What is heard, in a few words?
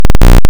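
waveform FM square triangle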